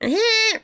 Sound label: hrmm; birdo; SM64 CO-OP